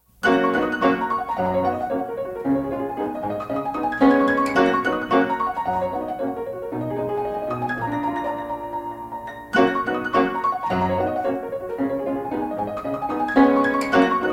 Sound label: Chopin Etude G Flat Major, Black Key Etude; Frederic Chopin; Opus 10; Twelve Grand Etudes